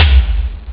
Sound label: tomtom; Amiga Soundtracker Sample Packs